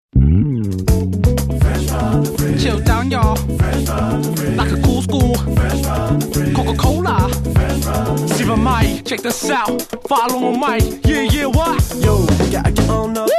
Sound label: groove tag; Coca Cola